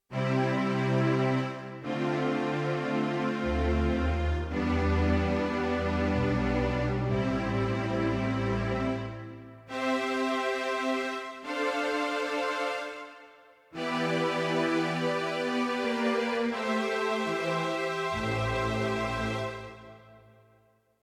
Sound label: Strings